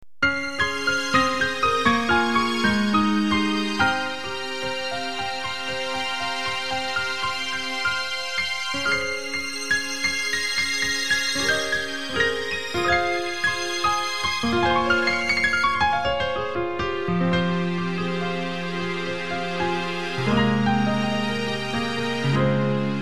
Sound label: improvisation